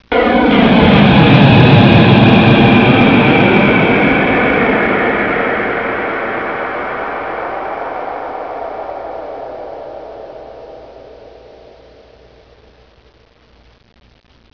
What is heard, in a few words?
Warp; WARLOCKSNDS